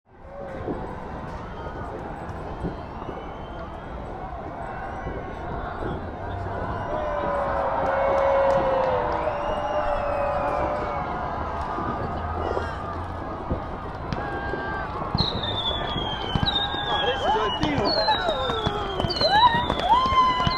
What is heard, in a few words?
london parliament hill; pcm m10